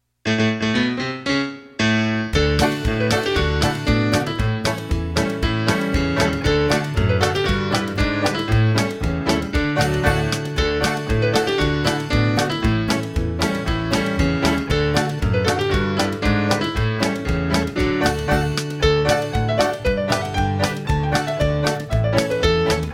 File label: hornpipe, instrumental